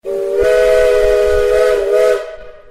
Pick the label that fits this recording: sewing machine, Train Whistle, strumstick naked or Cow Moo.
Train Whistle